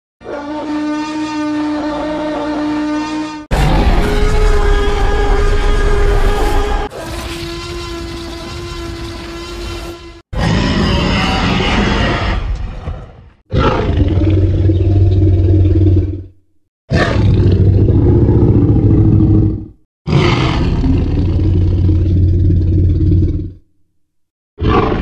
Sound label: dino sound effects